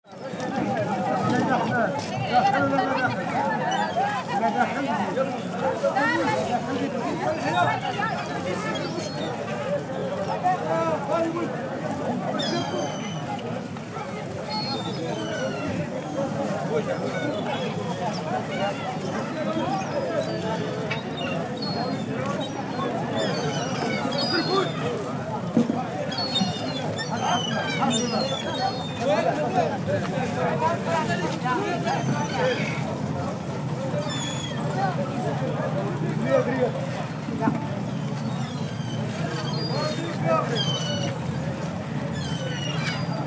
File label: harbor; eastern-sea